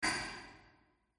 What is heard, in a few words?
warehouse brick drop; Half Life Alyx; rocks